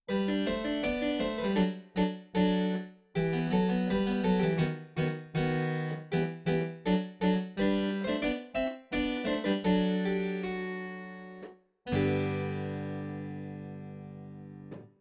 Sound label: harpsichord; roland hp601